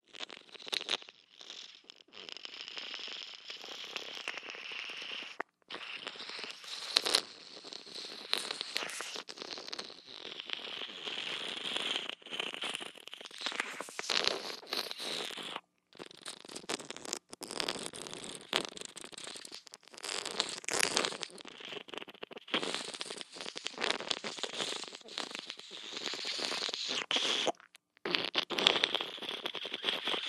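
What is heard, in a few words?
sounds of saliva ejector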